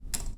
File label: elevator click